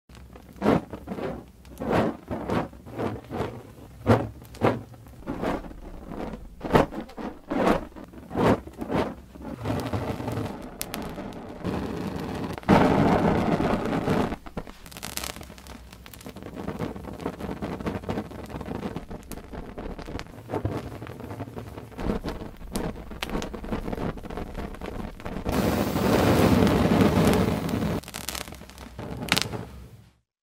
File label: fire torch